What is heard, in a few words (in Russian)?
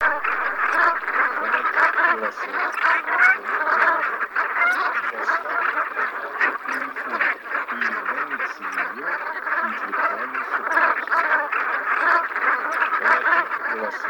Казарка черная Oca Colombaccio; plurifon